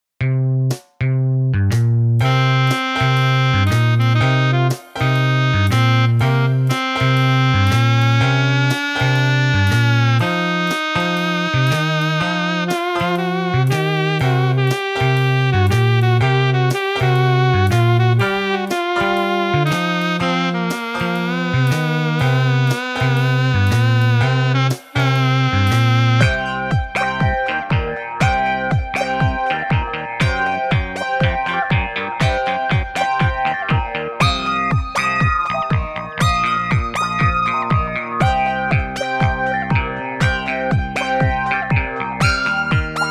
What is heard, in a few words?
MIDI blues